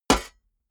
shovel impact

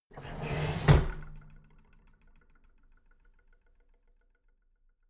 drawer big clatter close heavy

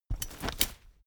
umbrella big close full hard

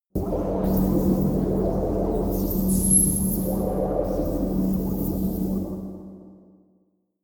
space fabric liquid transport close

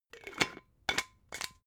glass bottle massive close slow

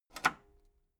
metal lever button radio disable medium

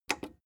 plastic button small simple click slow

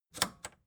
record player power click on slow

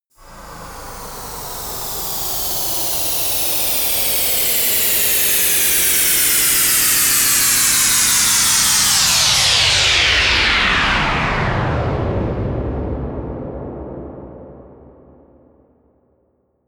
noise spin down drop

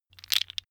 tiles wood

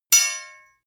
knives large hit chink high

